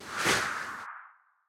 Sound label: Jar Equip